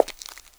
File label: pavement, footsteps; 1000fx